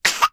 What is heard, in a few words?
human toddler 20 months old cough